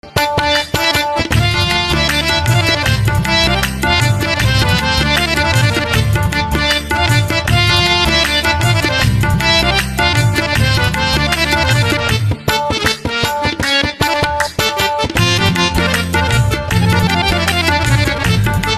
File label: Harmonium with Dholak Instrumental; ringtone